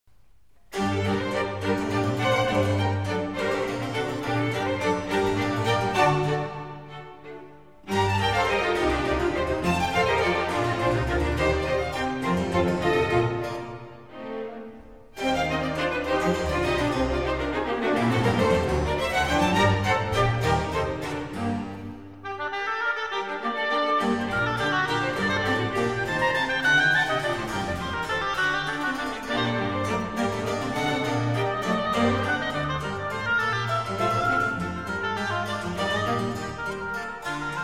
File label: Harpsichord Concerto in F minor; No. 5; (or for oboe or violin in G minor); BWV 1056; Presto